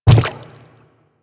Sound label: Inf Quadruped Medium Walk Water, Footsteps; Warframe; Infested